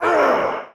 Hit Voice

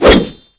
Woosh

